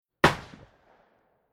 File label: Weapons Firing Range Gun Large Rifle Single Shot Distance Perspective; Tom Hutchings